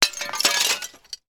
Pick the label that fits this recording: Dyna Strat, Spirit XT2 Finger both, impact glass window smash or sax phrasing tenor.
impact glass window smash